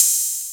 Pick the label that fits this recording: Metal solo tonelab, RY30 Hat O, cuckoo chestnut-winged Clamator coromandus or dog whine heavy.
RY30 Hat O